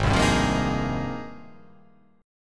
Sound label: Ab Major 13th, AbM13 chord; strummed; JGuitar